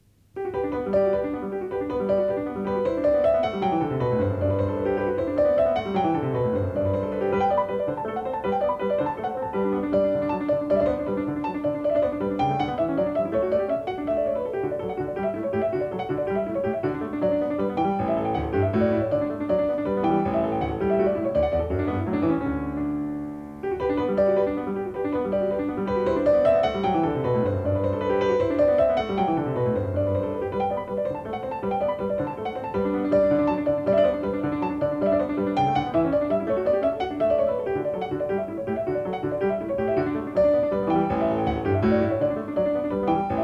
Sonata fo keyboard; Scarlatti; Sonates pour clavier; kk432

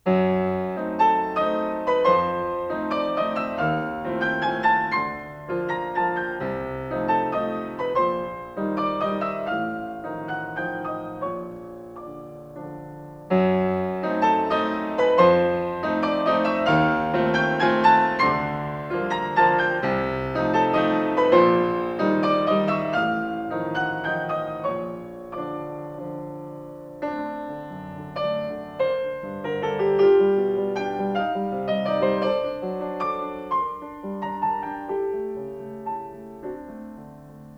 Carnaval for piano; Schumann; Op9

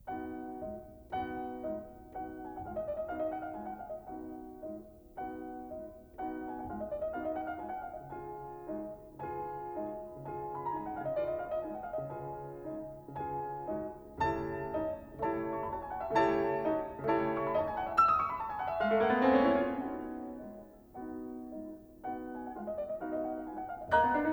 Pictures at an Exhibition for piano Tuileries; Mussorgsky; Graffman

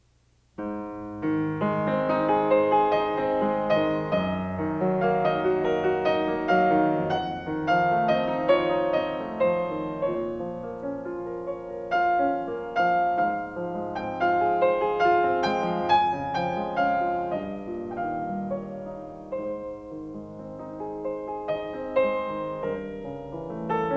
Carnaval for piano; Schumann; Op9

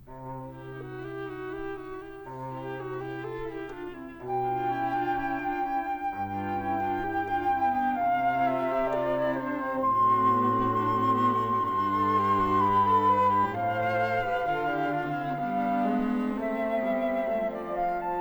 Mozart Flute Quartet No3 k285b; Pasquier Trio

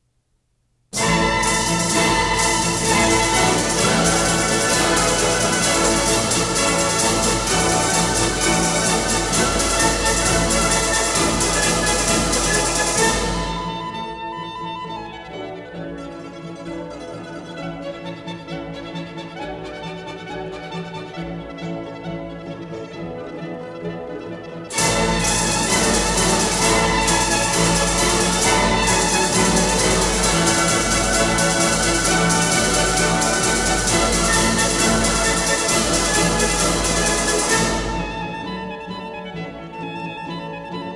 Capriccio Espagnol; Rimsky-Korsakov

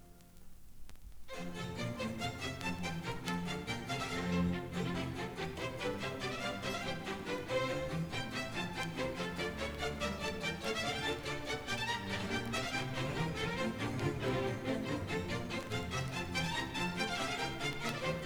Water Music; Handel; HVW348

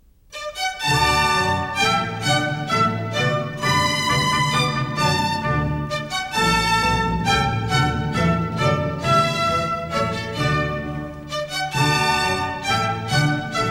German Dances; Mozart; K605

